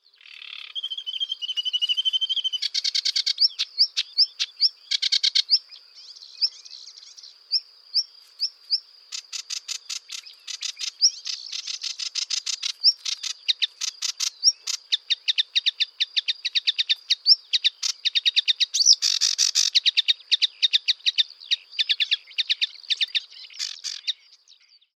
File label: Acrocephalus schoenobaenus